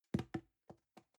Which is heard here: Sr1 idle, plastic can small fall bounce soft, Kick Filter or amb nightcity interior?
plastic can small fall bounce soft